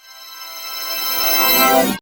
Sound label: organous, organous sweep; Spectrasonic Distorted Reality 1